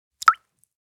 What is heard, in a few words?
Casting the fishing rod into the water